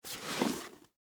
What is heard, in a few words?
Boot Take Off; Foley; Dressing